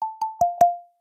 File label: notifications; 三星note10手机系统铃声; Orion